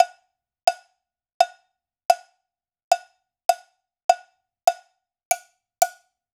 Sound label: Raw Perc Cowbell